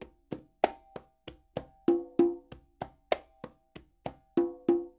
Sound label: SF LOOPS 96, NYC Percussionworks; Sampleheads